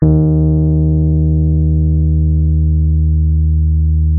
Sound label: MK1 D#2, RHODES; Xsample VOL-1